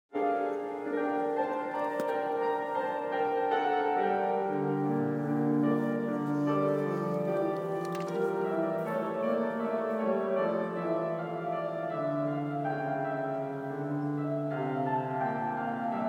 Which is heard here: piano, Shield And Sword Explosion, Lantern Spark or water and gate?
piano